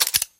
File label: ot38 switch, guns